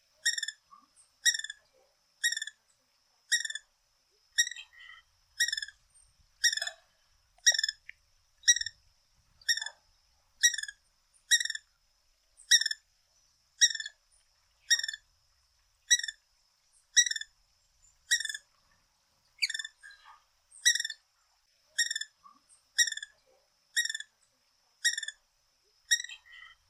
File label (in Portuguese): Canto do beija flor rubi